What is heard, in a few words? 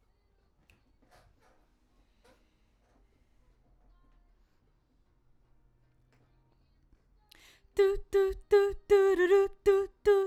BG Vox